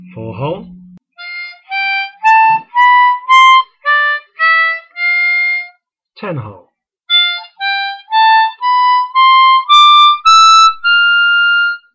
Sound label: Lydian mode; christmas